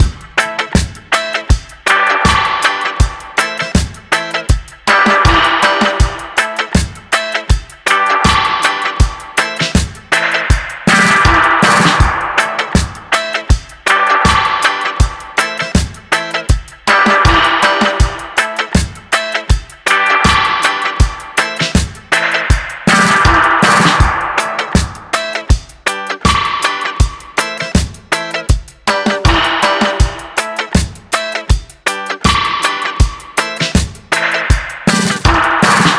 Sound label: Surfy Test Spring